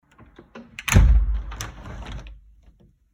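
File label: zahlopnuli okno, window slammed shut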